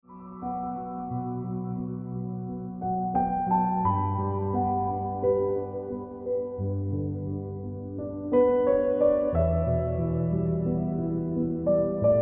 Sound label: Ringtone Piano Music